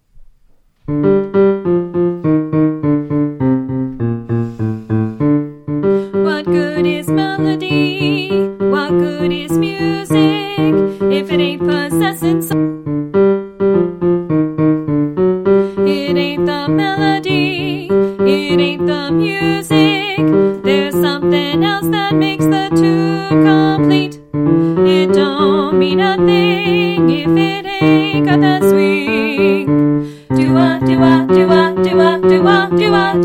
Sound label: alto 1 and 2